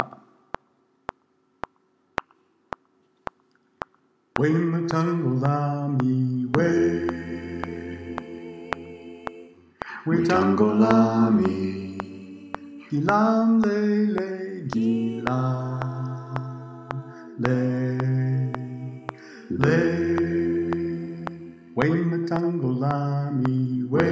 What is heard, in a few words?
Bass; The Freedom Choir